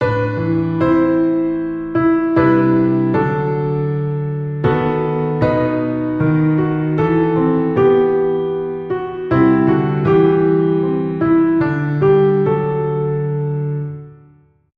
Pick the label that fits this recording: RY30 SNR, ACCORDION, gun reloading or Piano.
Piano